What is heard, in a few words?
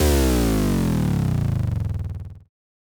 Bass Drop; SoundsCrate